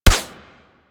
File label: Weapon Punch Bow Common One; Warframe; Weapon Punch Layers